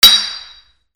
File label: BANGS